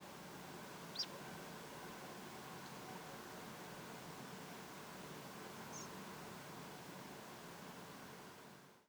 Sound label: wit, european pied flycatcher